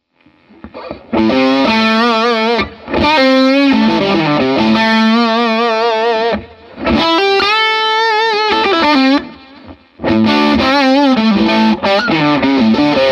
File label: El84 click humbucker, guitar